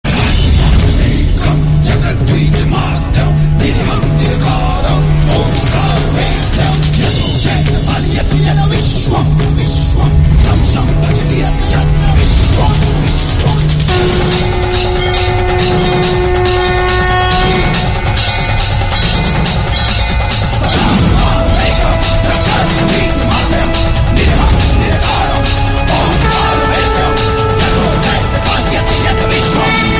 bhakti devotional hindi

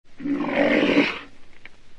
rhinoceros sound sms message tone notification

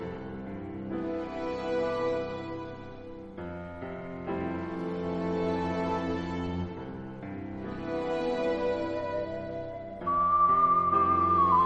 lullaby; zelda

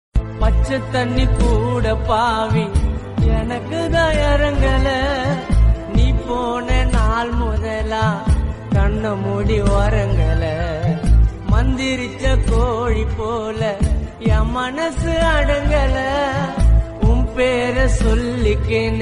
gana song tamil

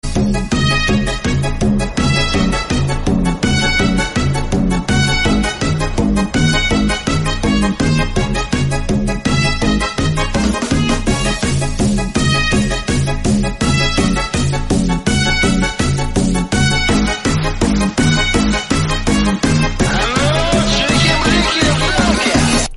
hardbass

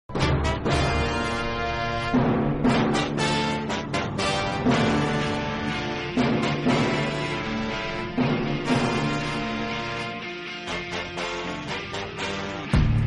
entry bgm; mahaan; dada

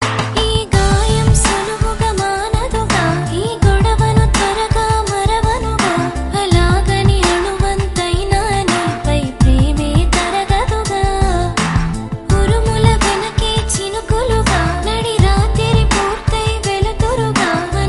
telugu song

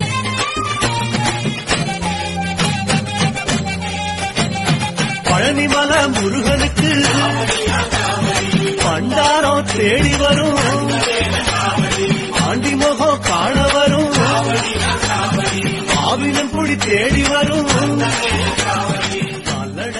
kavadi devotional south

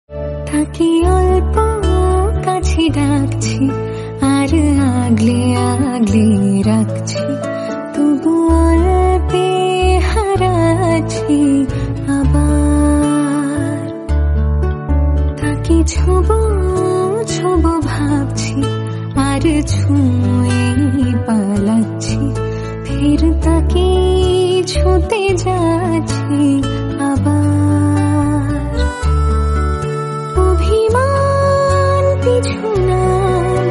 female version Telugu song

